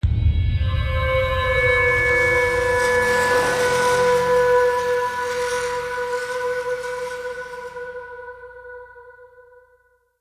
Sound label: Poisoned Cough Stinger; Bromius Quest; Neathuns